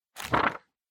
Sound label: Book Flick Through